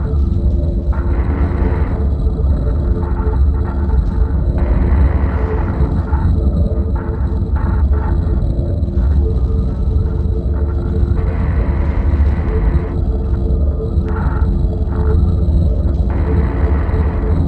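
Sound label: ai crisis room ambience; Stellaris; synthetic dawn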